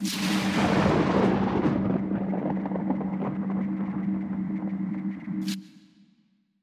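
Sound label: Lightning Distance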